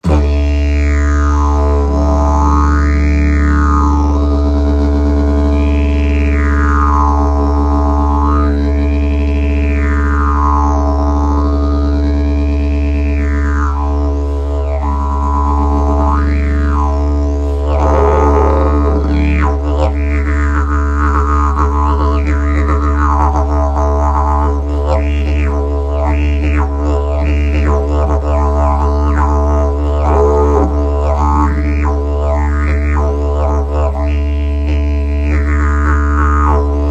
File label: Didgeridoo sound